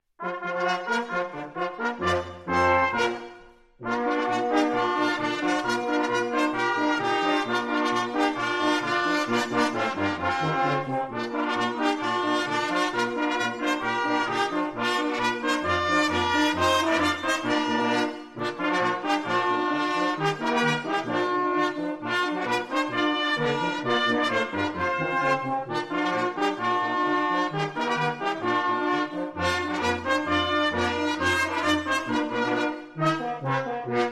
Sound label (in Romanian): Fanfara